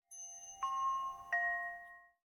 Ambience Scatter Chimes; Josh Journey Darkness Totems; PS4, Switch, Windows, Xbox One gamerip; Santuario

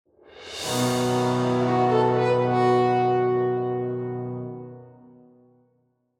Levelup

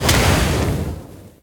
Spherespawn; Likho

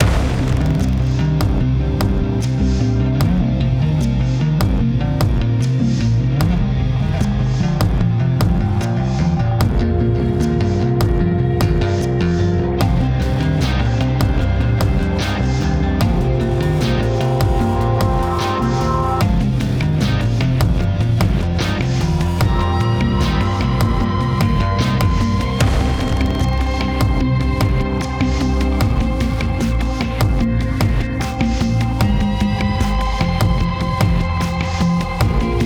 Music Loading 75bpm Game Mix; Forza Motorsport 6 Apex

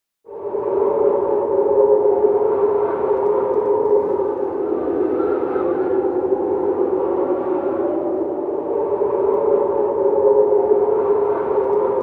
Snow Storm Ambient; Lost Planet; SnowStorm Ambient (Type N)

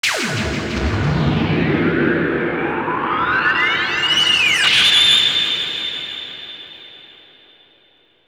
Str G#

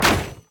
Wood Sword Hit

